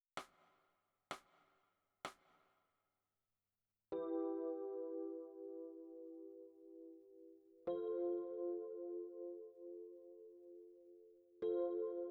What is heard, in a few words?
E Piano